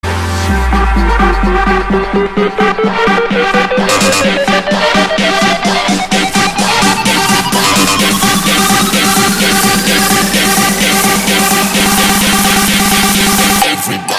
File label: electro